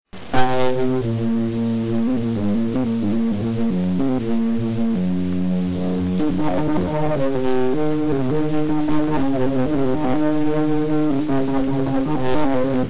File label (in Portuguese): orgao